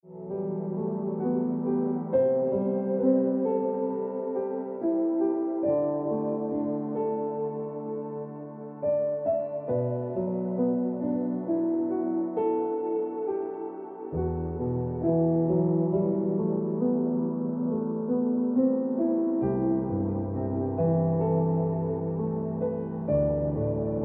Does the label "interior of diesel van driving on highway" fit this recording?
No